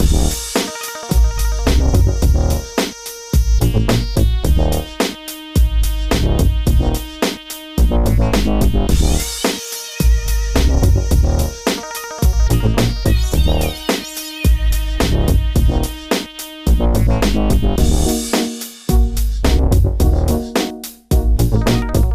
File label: Menu attraction